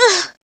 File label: Moan